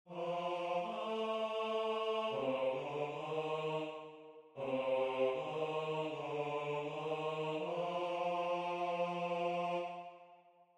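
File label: Baritone, barbershop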